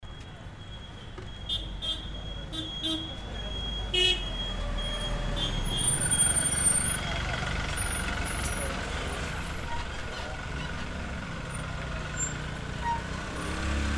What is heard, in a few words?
VEHICLES INSIDE A MARKET PLACE